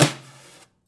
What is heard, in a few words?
Prepared Drums